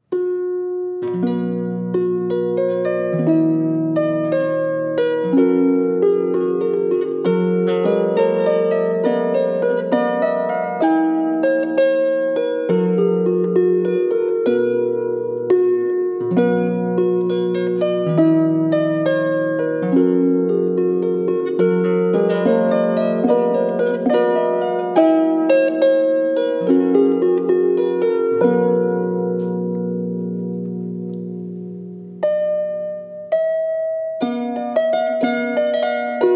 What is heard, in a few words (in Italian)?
ARPA CELTICA